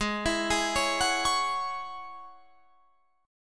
G sharp 7th Suspended 4th Sharp 5th G sharp, Guitar Drop D